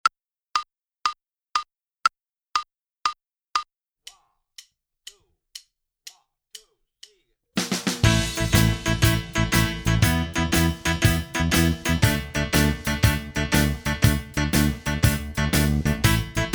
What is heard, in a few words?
Minus Guitar; Inst Ensemble